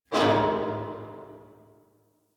Parking Lot Metal Door Distant

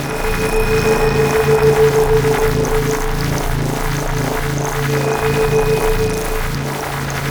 Shield Loop; Gameplay; Warframe; NineteeenNinetyNine; PvPvE

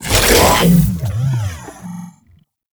Devour Impact; Warframe; Grendel; Grendel Deluxe

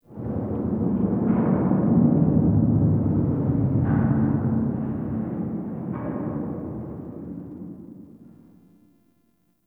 Distant Eerie Metal Bangs; Warframe; Ambience; Nineteen Ninety Nine

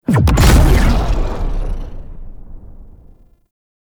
Cloak Remove; Warframe; Frumentarius

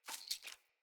Foley Sneeze; Friendly; Avakot

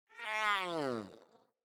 Kitten Yawn; Enemies; Catbrow

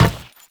Step Metal, Footsteps; Enemies; Warframe; Teshin

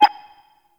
Interior Orbital Station Console Rollover; Warframe; Tau

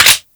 Grineer Lever Action Pistol Reload; Weapons

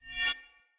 Sphere Polearm Whoosh Accent; Weapons; Player

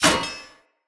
Great Sword Recall Catch; Weapons; Swords; Dendrit Great Sword

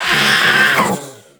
Combat Vocal Death; Warframe; Catbrow

